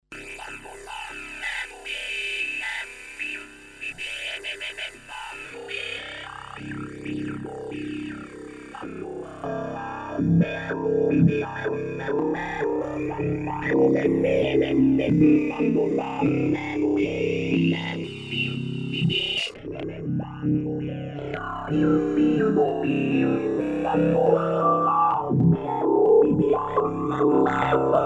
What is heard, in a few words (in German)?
The Voder mit Transwave; WAVeBOY Industries